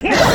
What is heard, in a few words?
run, creatures organic